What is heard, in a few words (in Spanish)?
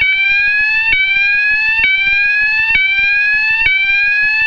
tono